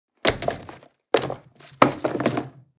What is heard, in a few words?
Picnic wicker basket packing food